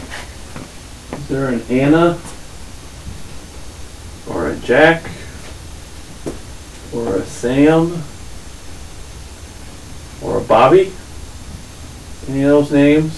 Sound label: basement